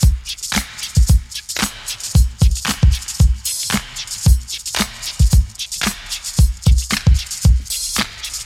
114 bpm high quality drum beat c key, Free breakbeat - kick tuned to the C note.